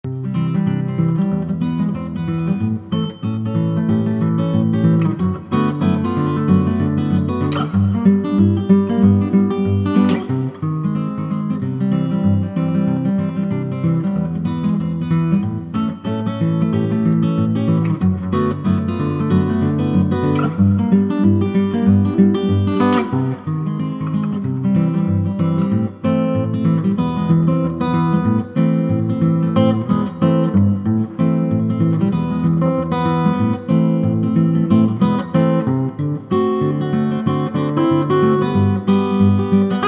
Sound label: Blues, Acoustic Guitar